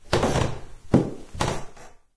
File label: wood creaks; random amb sounds